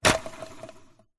leisure toy plastic button press spinning drum spin